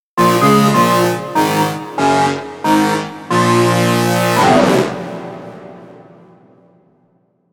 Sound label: ORGAN FX